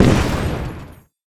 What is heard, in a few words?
Wrapped Multi Explosion; Candy Crush Soda